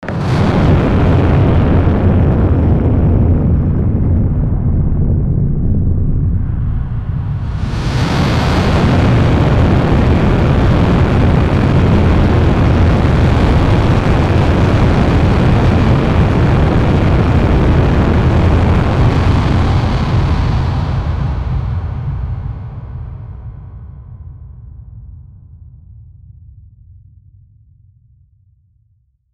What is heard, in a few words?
ambient ruin, dystopian; ambient ruin capitalist